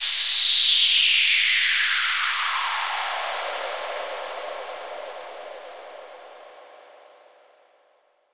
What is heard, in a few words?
Downlifter